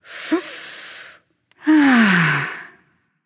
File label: nv breath; smite; awilix